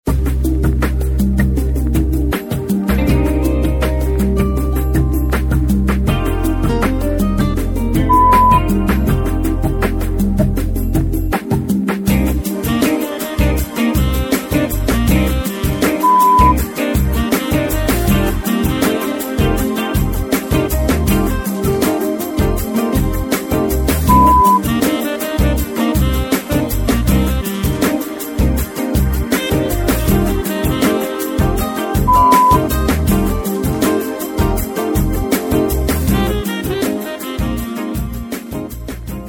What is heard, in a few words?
Chill Out